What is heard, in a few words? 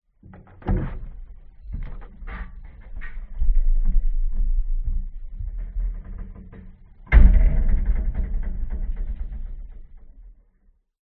transport boat movements on deck recorded underwater hydrophone